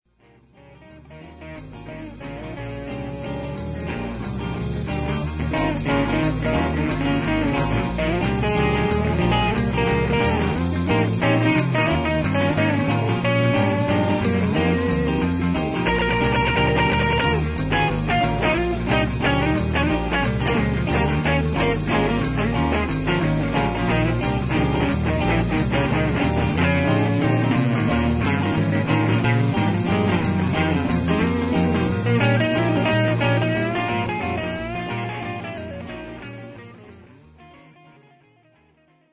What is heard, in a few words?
blues